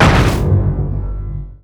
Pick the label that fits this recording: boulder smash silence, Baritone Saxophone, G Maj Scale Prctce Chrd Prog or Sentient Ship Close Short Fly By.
boulder smash silence